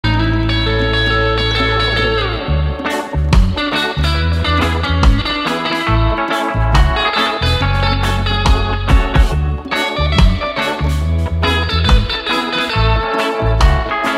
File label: Reggae